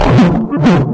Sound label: strider pain; npc; Garry's Mod; hl2